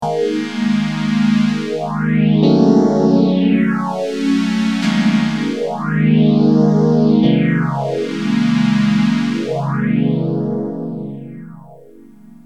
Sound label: m1000 boss ph1r